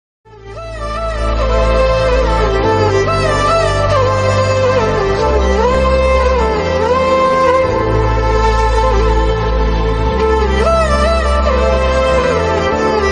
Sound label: tamil bgm ringtone flute background tone; jaanu